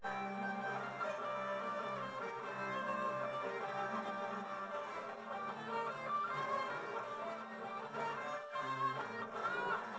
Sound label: nodrums; SiSEC10; underdetermined